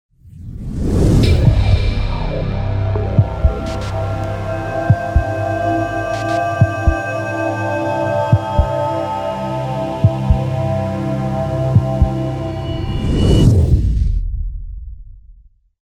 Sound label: Futuristic Logo Intro